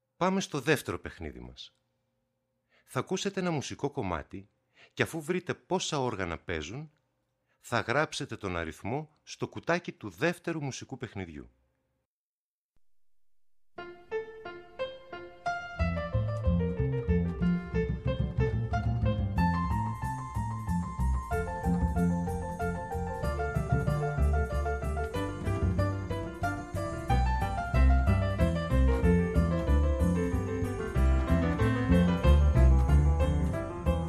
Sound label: music game